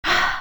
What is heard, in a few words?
human breath female; cso